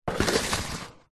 card box product pack slide on hard surface; zapsplat

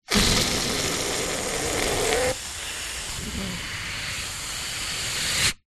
foley balloon deflate rasp

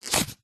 foley polystyrene foam rub scrape short; zapsplat

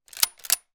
weapon gun; charles daly; still north media

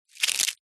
household foil small scrunched up wrap movement

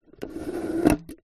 food drink straw slide out plastic lid fast food; zapsplat

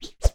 sport racket tennis or badminton swing swipe; zapsplat

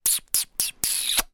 human small kisses than large

